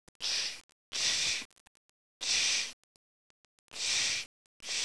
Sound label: stridulation